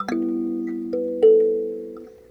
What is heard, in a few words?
Kalimba Walk; Sonic Foundry (Sony Creative Software) - Friendly Fire